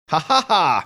hahaha

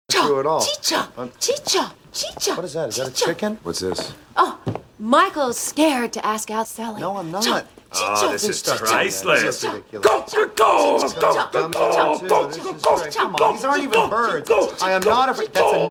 chicken sounds; lindsay bluth fünke; gob bluth

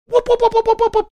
making whooping sounds

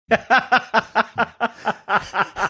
laughing

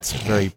snorting

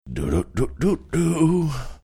singing portion mcdonalds jingle

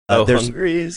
singing oh hungrys

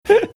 quick inhaling sound

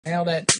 clap after

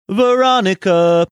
singing

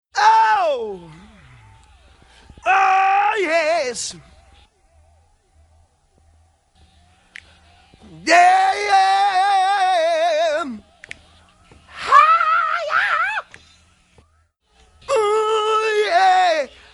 singing and screaming without music

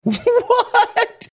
laughing saying what

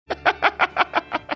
chuckling

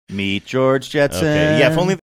singing meet; george jetson

